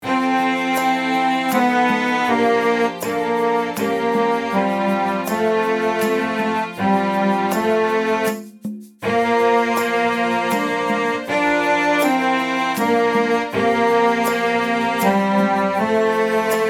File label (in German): leise tenor langsam; Takt 5 - 22, langsam; Strophe